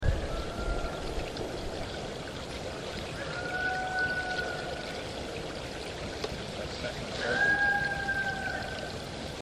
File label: trinity river vocals; Unknown Vocals; This was recorded on the Trinity River in December of 2009. There are 3 vocals, short howls or elongated whoops.; We lean to Fox for these